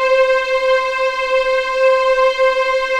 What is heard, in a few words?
C5 EXP MF, VIOLINS; East West; The Ultimate String Collection